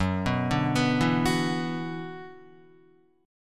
Gb 7th Suspended 4th Sharp 5th Gb Guitar; Gb7sus4#5 arpeggiated

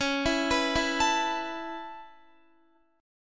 Db Minor 7th Sharp 5th Db down Guitar; arpeggiated; JGuitar

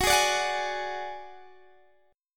Gb Suspended 2nd Sharp 5th, Guitar, DADGAD; x,21,18,19,21,18; down; chord shape

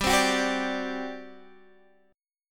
G Major 7th Suspended 4th, Guitar, Open D; down

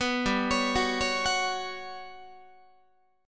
G Major 7th Flat 5th, Guitar; GM7b5; arpeggiated; 14,15,16,16,17,17

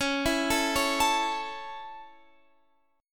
Db Minor Sharp 5th, Guitar, Standard; arpeggiated; x,16,14,14,14,17

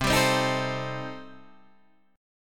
A Minor 7th Flat 5th C, chord

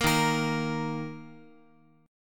Eb 5th, Guitar; Eb5 strummed; x,x,x,3,3,5; JGuitar

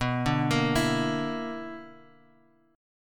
B-Minor Major 7th Double Flat 5th, Bass Standard; BmM7bb5 arpeggiated; JGuitar

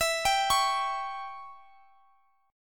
E Minor 6th E

